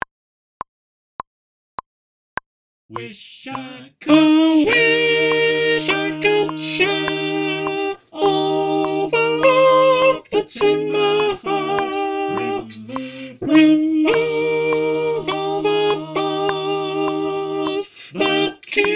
sop clicktrack, choir

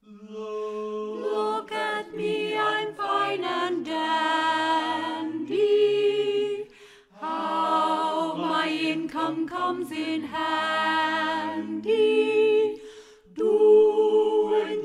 sop, choir

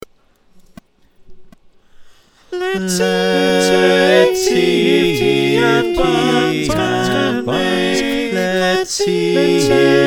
SATB, choir

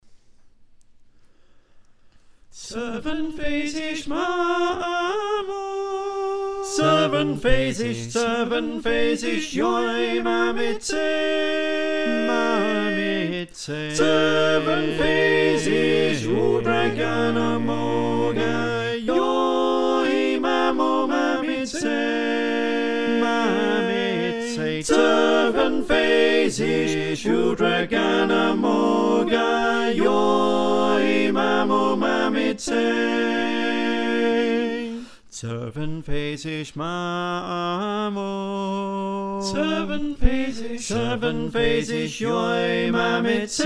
SATB

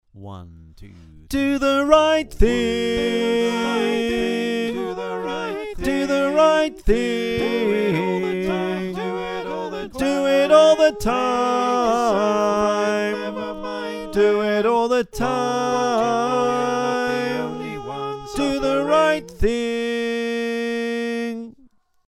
Tenor, choir

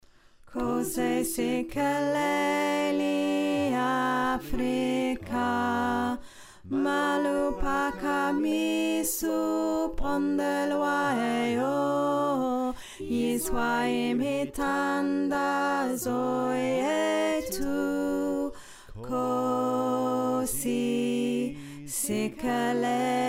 Tenor, choir

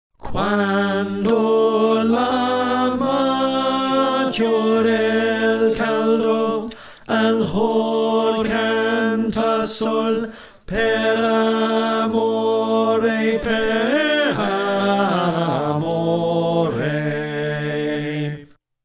Slow Tenor

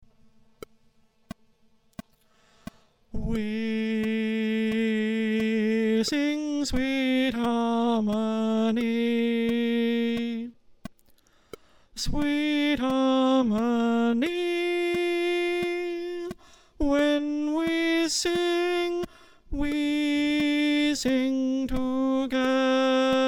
alt part 2

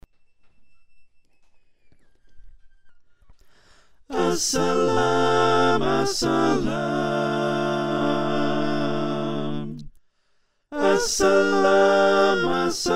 SATB, choir

